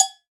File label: CHARANGA, Latin Hand Perc; E-MU Producer Series Vol. 2; More Studio Essentials; Composer